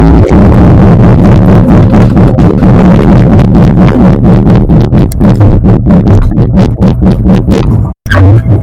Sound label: eating mic; earrape; gmod; darkrp